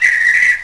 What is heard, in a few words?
birds; Jump Zampoli